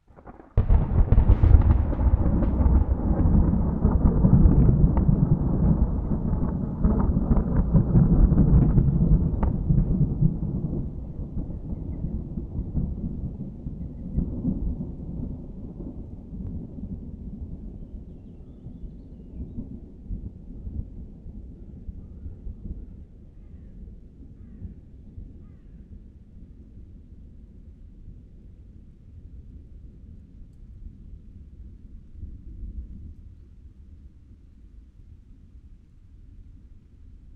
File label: heavy thunder strike no rain; quadro